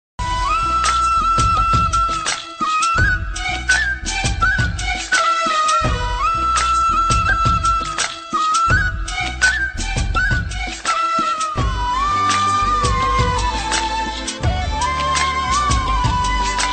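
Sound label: romantic background music; pappi appacha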